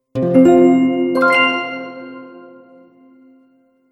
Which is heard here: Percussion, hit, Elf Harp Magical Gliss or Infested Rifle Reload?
Elf Harp Magical Gliss